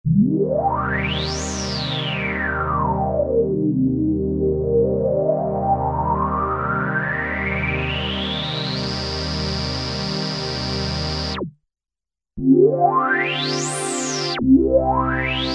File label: Korg Poly-800, Resonant Sweep